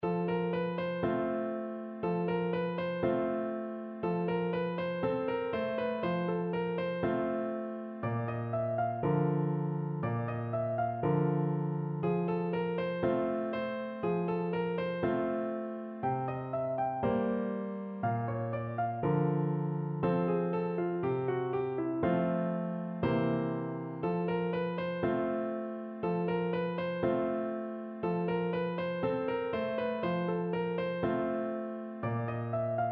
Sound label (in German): blues f dur klavierbegleitung